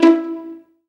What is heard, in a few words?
STR PIZZ, STR Pizzicato; Sound & Vision; Gigapack I CD 2; Orchestral